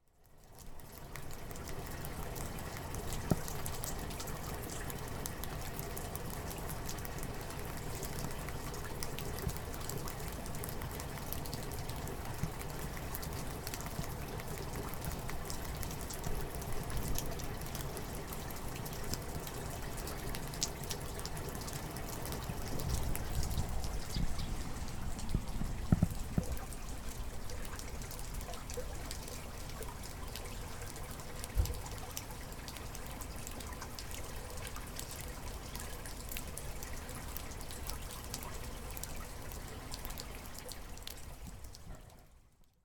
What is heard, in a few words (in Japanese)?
yuki mizu ga yane kara ochiru; 雪（水）が屋根から落ちる; Chino Cultural Complex; 茅野市民館